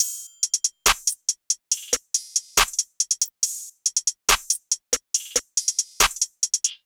Drum Loop 140 BPM; Vapor; Cymatics; Mystery Pack Vol 4

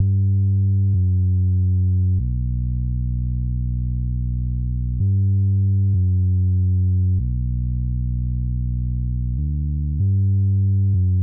96 BPM B Min Bass, West Coast; Cymatics; GHOST (Beta Pack); Forever

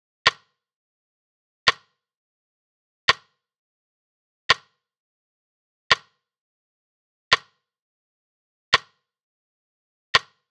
Drum Loop 85 BPM Rim, Lofi; Loop Stems; Cymatics; GHOST (Beta Pack); Breach Drum Loop

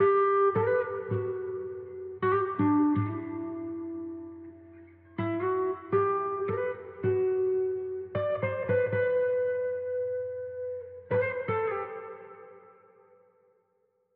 162 BPM E Min Guitar; Guitar Pack; Loop Stems; Cymatics; Miami Guitar Pack - Pt 1; 27 Club

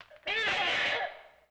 Raspy String Can Pull; Vintage Cartoon; Foley

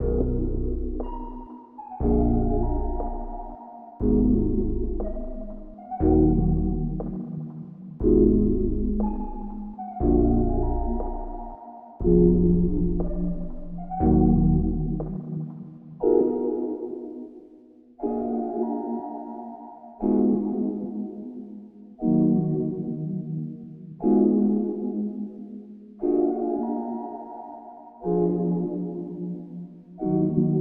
120 BPM D# Min, Melodies; Cymatics; 2022 Melody Mystery Expansion

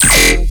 Bass Hit E, Dubstep

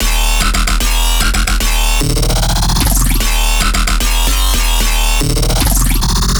Bass Loop D#, Dubstep, 150bpm; Harmonoman; Ghosthack; Dubstep Sound Arsenal

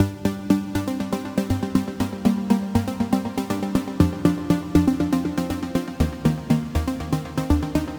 Adventurous Melody Gmin 120BPM; Leads; Ghosthack; Awakening

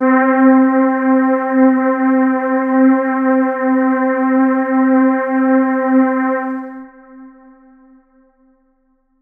Kit Keys C, Lo-Fi; One Shot; Ghosthack; Golden 94 Dm; HipHop

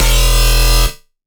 Bass E Savage, Dubstep; Bass One Shots; Ghosthack; Dubstep Sound Arsenal; 50 Bass One Shots

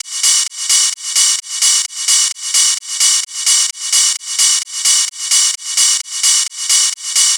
Ride Loop 130, Techno; Techno Sounds 2024; Rides

